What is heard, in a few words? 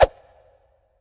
STAIR, AIRSTICK WET; Q Up Arts; Denny Jaeger Private Collection